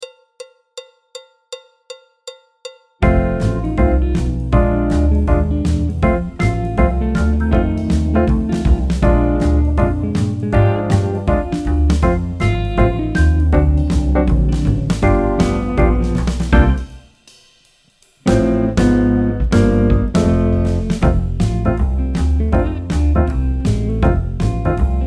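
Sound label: backing track, Jazz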